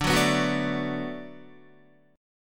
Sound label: D 6th D down Guitar, chord; D6 strummed